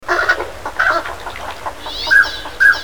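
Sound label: onagakamo, northern pintail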